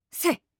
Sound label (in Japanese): 攻撃